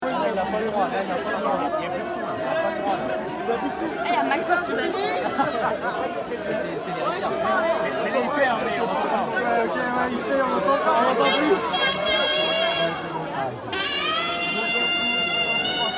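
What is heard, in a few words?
vecherinka, party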